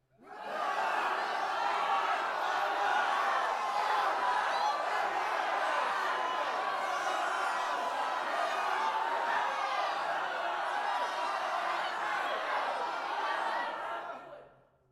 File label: Crowd Commotion Battle Riot; Shanevincent; Ambeovr